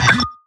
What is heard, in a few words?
Hurt; Robbit